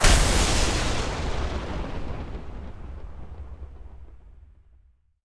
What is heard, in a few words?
strike impact; mage lord